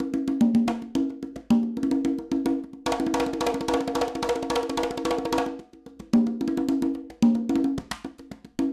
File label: Acoustic Percussion